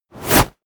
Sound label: wipe